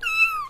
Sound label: Meow; smbx38a